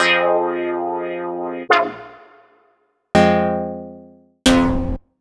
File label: stabs